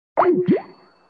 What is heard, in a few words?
call disconnect